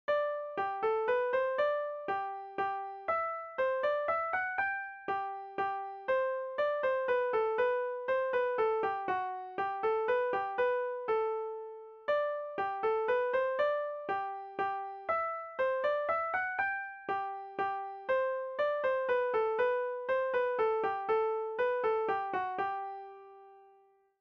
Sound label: minuet excerpt; bach